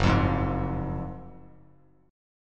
F sharp Major 7th Suspended 2nd, F sharp, down, Bass Standard; strummed; JGuitar